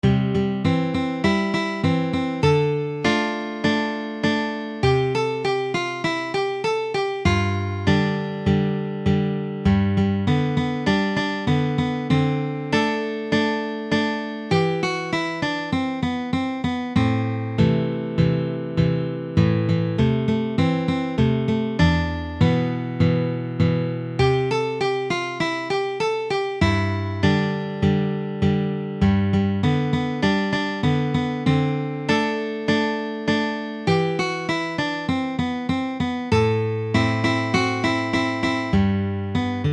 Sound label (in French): hymne mexicain